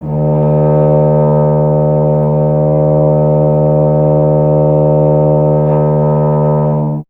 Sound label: BRS TUBA, Solo Instruments